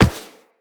Walk Metal, Footsteps; Operator

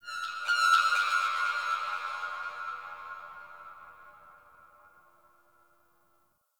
sentient harmonic cries; Warframe; Tau

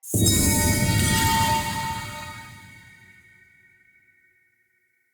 Light Mirror Beam Hit Positive Target B; Puzzles

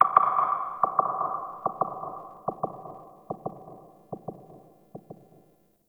Grineer Frogman Patrol, Vocals; Warframe

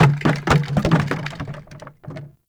Large Plastic Garbage Bin; Warframe; Ambience; Nineteen Ninety Nine

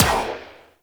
Venus Guard Turret Rifle Fire; Turrets

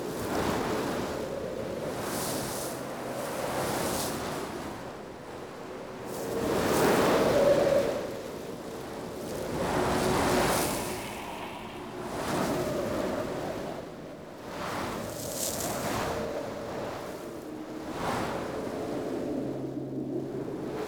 Landscape Wind Medium Loop

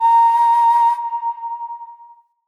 Flute Alt A Sharp; Player Instrument

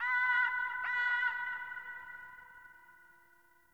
Birds High, Ambience Plains Landscape; Warframe; Eidolon Plains

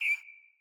Bird Single Chirp; Josalfar Bird Guide

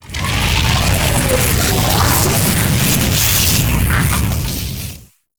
Arson Shockwave; Warframe; Eximus

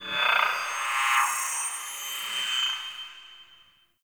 Class Lesson Slide; Warframe; The New War; Zariman Class Quiz

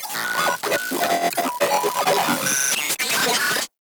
Vocal Idle; Moa; Vocal Set D

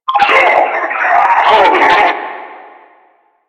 Death; Warframe; Lasrian; Lasrian Enemy B

